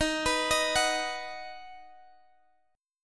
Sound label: D sharp Minor Sharp 5th, Guitar, DADGAD; x,18,21,20,21,x; chordshape